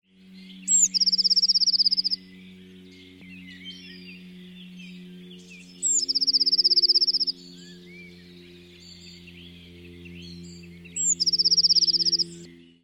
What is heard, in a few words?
song; rudbarzi; i.folkmanis